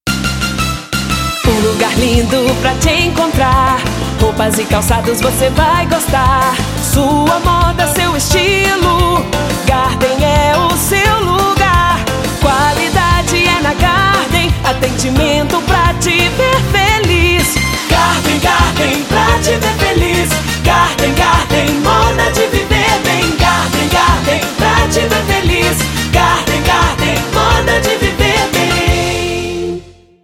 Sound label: jingles; garden moda jovem